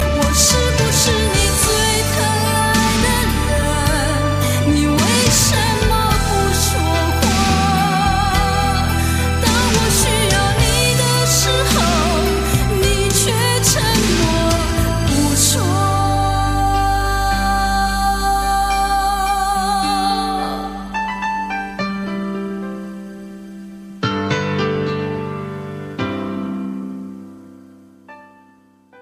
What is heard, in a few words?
Chinese Song